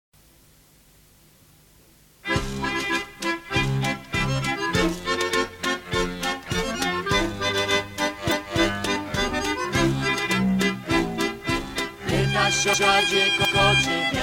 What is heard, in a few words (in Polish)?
hymn kociewski